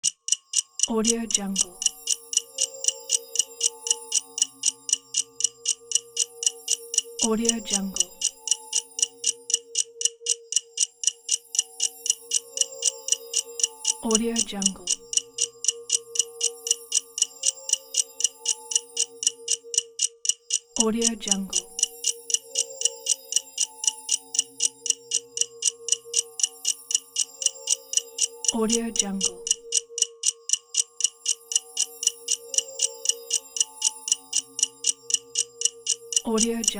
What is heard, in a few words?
timer ticking